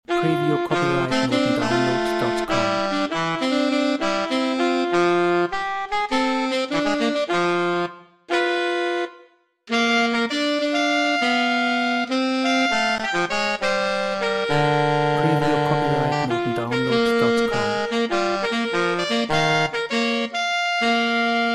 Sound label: SAX